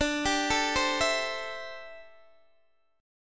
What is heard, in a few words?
D 7th Suspended 2nd Suspended 4th D, Guitar DADGAD; D7sus2sus4; arpeggiated; x,17,17,14,15,14